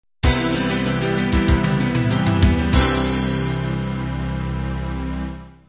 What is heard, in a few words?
folk ballad end